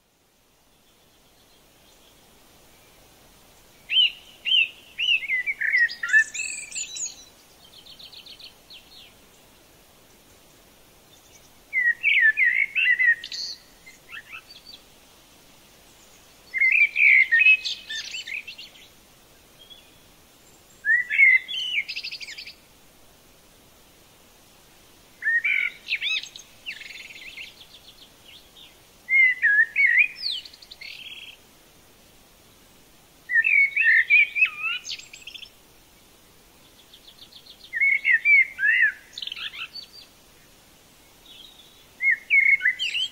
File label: Relaxing Nature Sound, Polish Birds; Carpatia